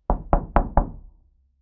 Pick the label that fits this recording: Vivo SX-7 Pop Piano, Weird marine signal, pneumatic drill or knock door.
knock door